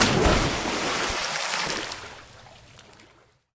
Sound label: deep water fall; Golden Crayon Sounds